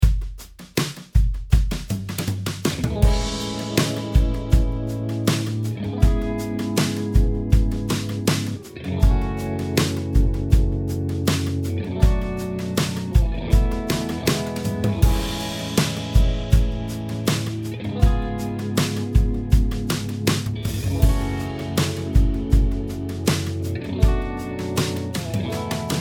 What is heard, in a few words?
Secondary Dominant Example, guitar chord substitutions